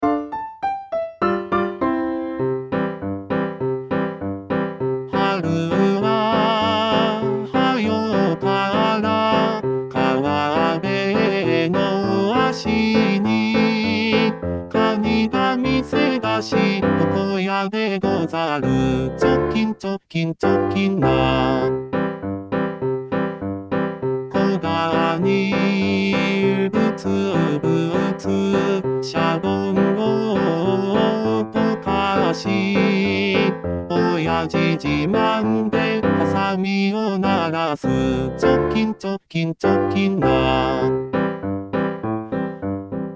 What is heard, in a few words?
male